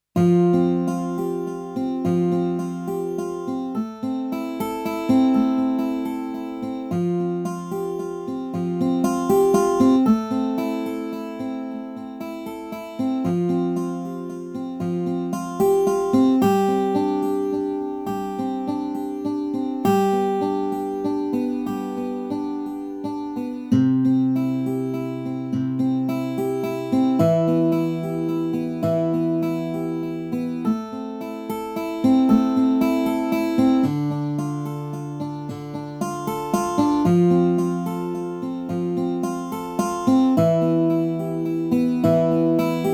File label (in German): gitarre